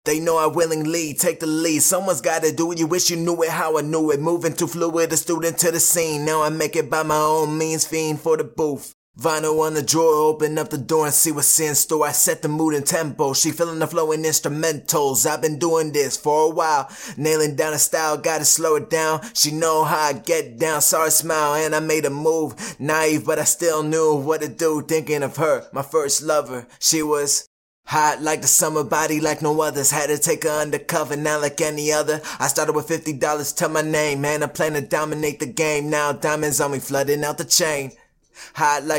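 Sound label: hip hop, 90, E flat minor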